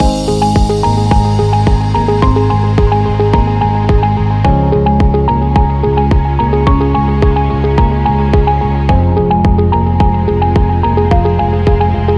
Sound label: Minimal Loop